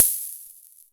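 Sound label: univox open hat, drum machines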